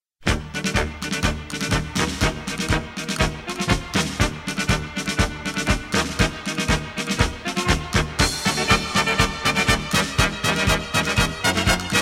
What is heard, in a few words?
Spanish Gypsy Dance